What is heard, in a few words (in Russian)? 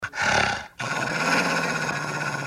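волк скалится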